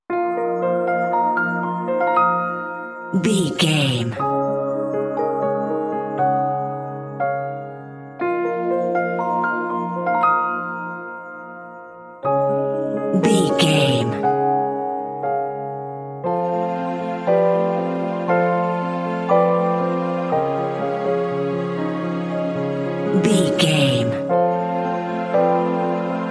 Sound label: enchanted mystical forest no lead